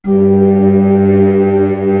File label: 01W CHURCH; East West; Global Sounds